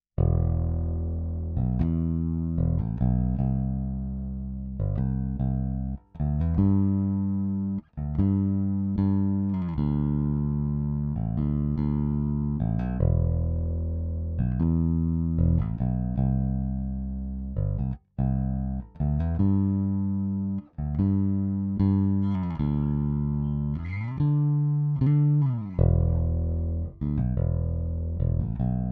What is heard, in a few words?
bass